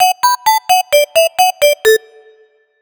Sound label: mail jingle; deltarune yellow translation